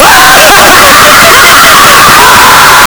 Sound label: evil laugh; hazama